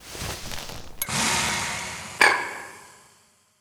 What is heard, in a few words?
Jar Use